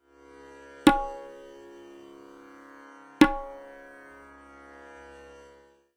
TALAS Bol Syllable Ta

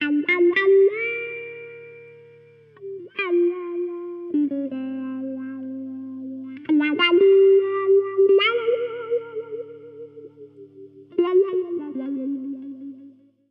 Speech Wah; creative wah pedal use; ragajunglism

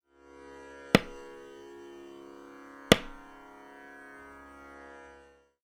TALAS Bol Syllable Ra

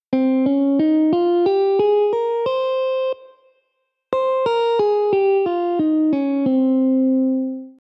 Phrygian; scales

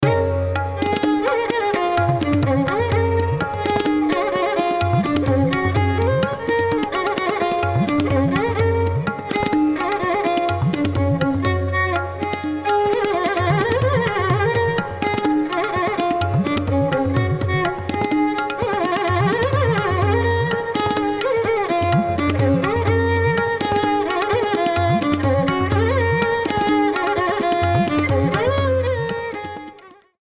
EKTAL Bhatiyar

